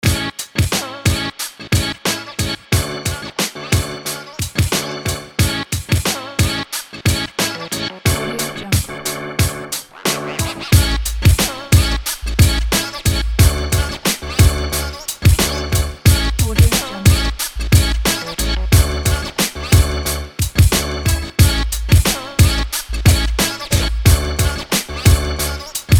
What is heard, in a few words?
hiphop